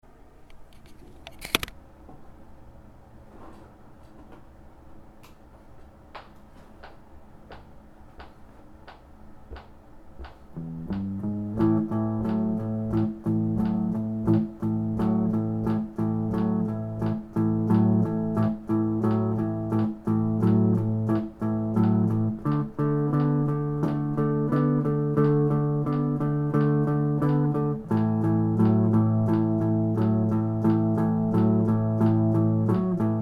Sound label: 12 Bar Rythm, guitar